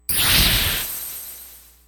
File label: crystal beam